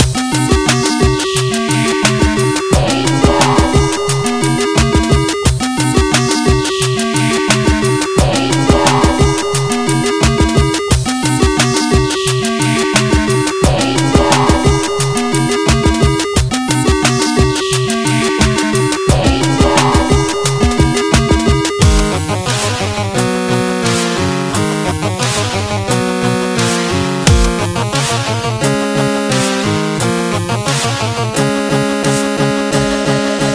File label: drum n bass remix